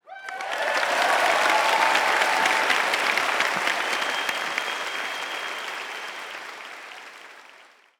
applause gold; CrossCode

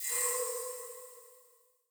ferro switch on

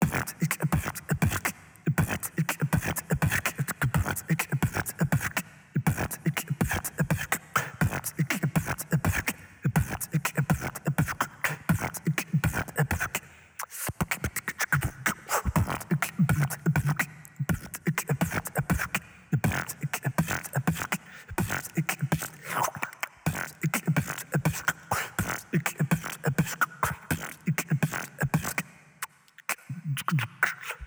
beatbox; CrossCode; battle; beatbox-penguin

